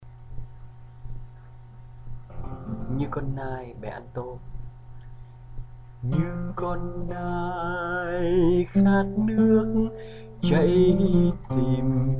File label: Alto